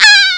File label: orb death; WormWars